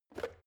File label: plastic water boiler lid off